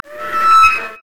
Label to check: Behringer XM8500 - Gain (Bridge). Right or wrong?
Wrong